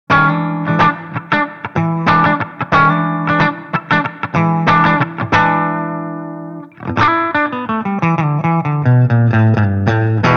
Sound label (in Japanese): Clean 原音